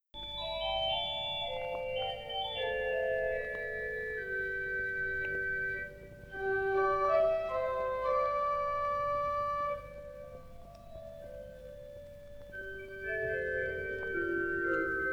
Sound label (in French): Improvisation à l orgue